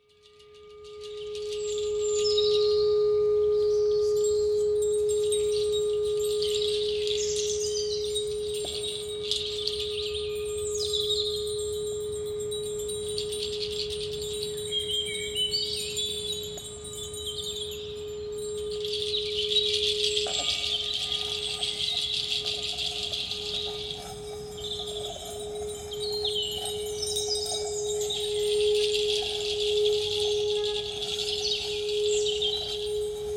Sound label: Asian Zen Spa Music Meditation